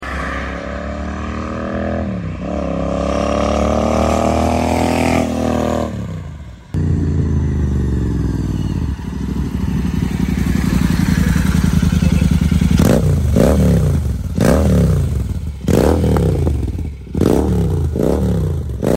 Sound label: Cruiser flyby, motorcycles